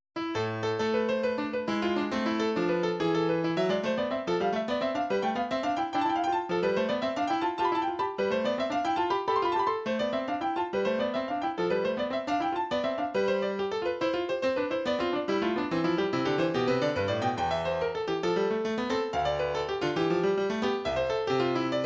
classical gigue

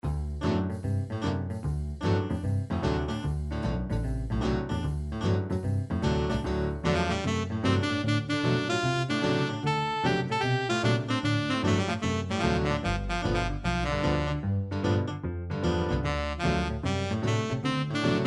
sax

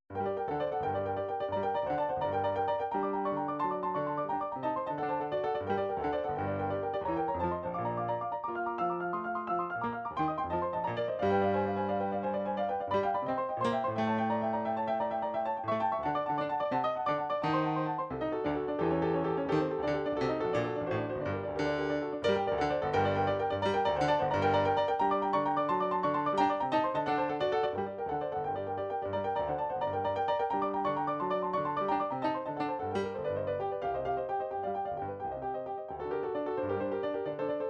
studies for piano, midi

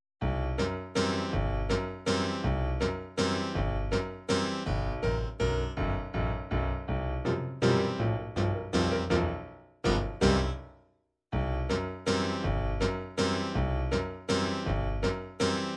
latin vals mariachi, midi

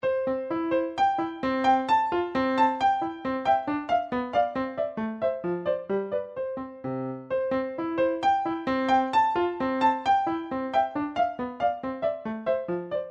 twinkle, midi; song book

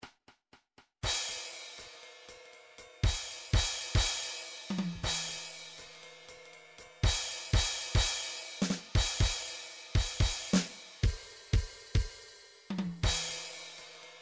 jazz 240 swing ride half time, midi drum styles

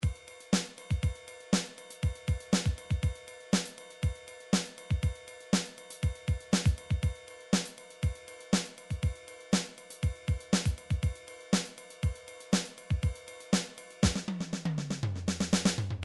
midi drums drums

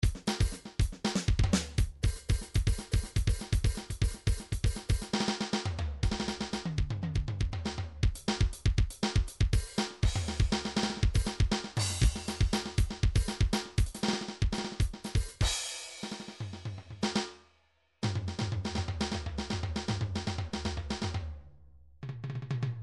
midi vol 4 drums percussion

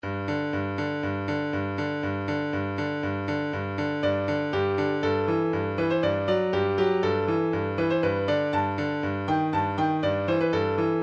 piano arrangement; gameboy; legend of zelda; mabe village

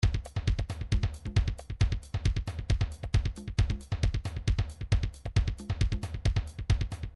trance midi loops beats kicking; Newtronic Trance Constuction Kit

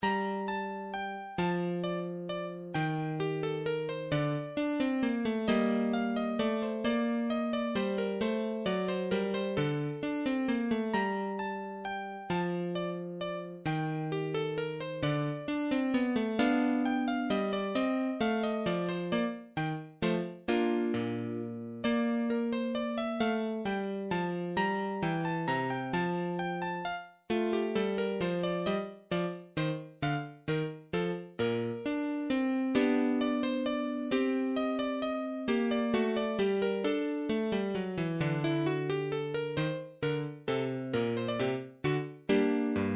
classical minuet, midi

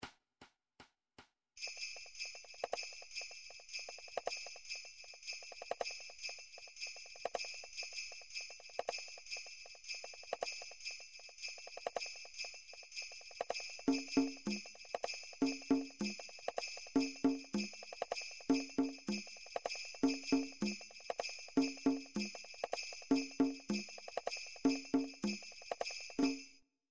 drum trax per; Drumtrax Library 2

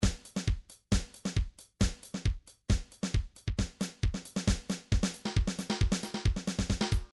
trance midi loops beats

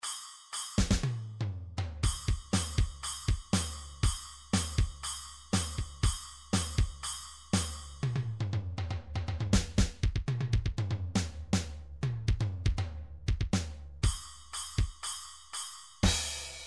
drums rock, midi drums

